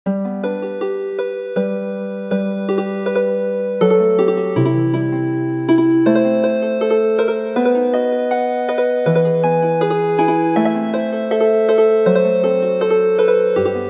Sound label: Healing Harp